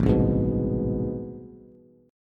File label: A Minor Major 9th, Bass; AmM9 strummed; 5,3,6,4; JGuitar